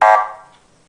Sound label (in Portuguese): buzina; deathmatch; zn sirene